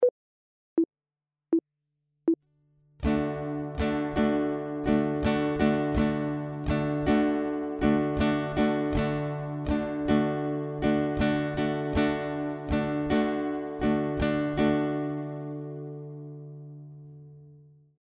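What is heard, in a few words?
chord, guitar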